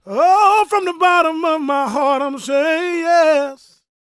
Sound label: GOSPEL MALE; Spectrasonics Vocal Planet